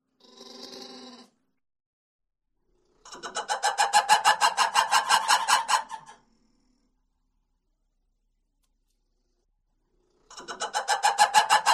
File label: ROUGH LEMUR BARKS HOWLS; wildlife sounds